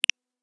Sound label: slick notification